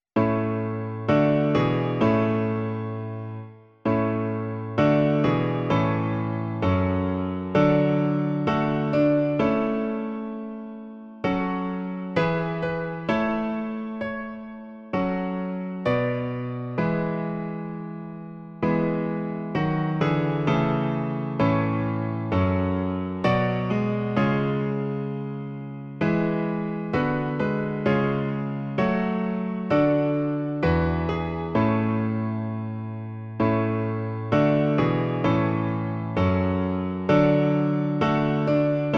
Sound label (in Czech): klavír; Zpívejte Bohu píseň svou; Klavírní verze